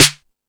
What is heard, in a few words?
All Snares, E-MU Pro-Cussion; drum machine